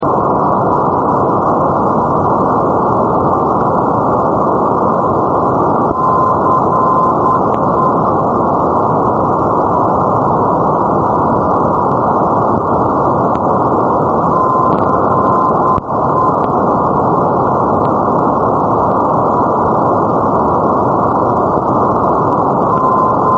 ndb osijek; poellau

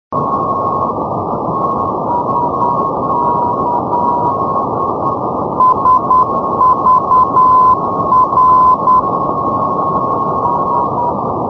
ndb sagvar; poellau

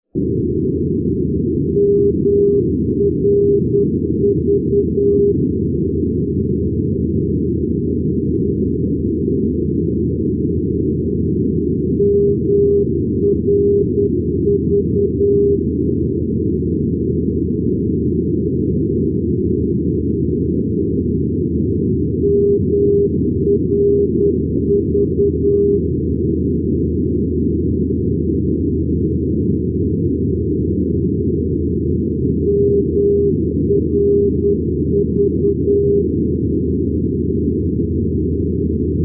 ndb merville calonne mrv 404; europe

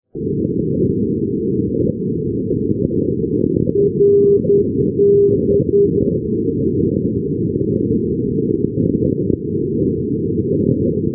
ndb ajaccio campo del oro; rb; europe

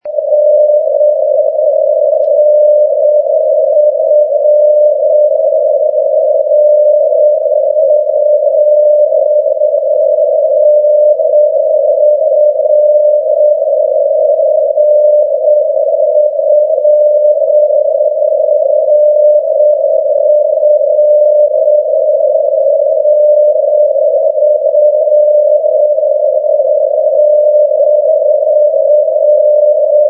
ndb falkoping, 397.0; europe

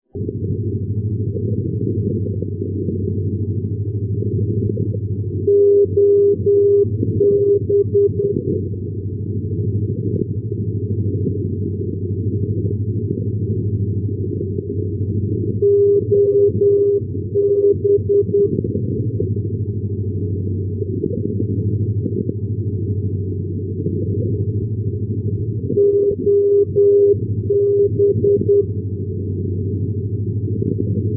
ndb marseille provence ob 395; europe

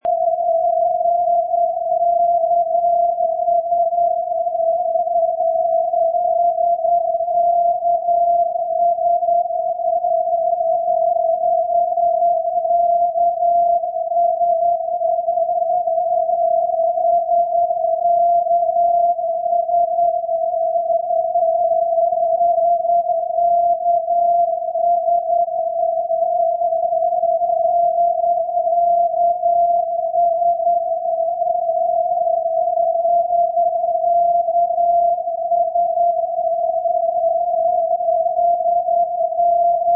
ndb 330; karlstad; europe; kiwi

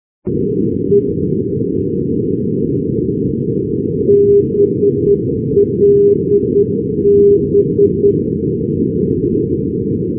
ndb blois le breuil blb(e) 397; paris; europe

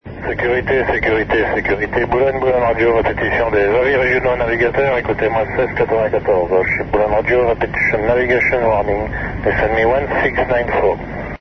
boulogne radio 2182 khz; europe

